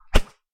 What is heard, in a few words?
WHOOSH Bright; mono; Universal Sound FX; WHOOSHES